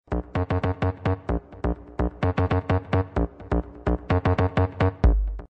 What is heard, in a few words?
electronic